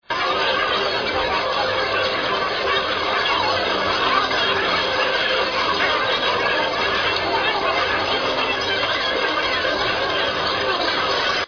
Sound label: AMBIENCE, Roulette; SevenDeuce; sapphire Roulette